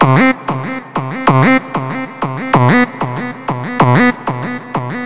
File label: Acid Alert